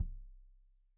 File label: Kik Stereo; Deeply Sampled Drums